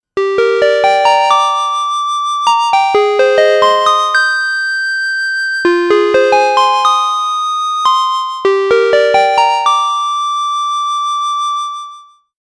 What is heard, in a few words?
Glassy, Juno6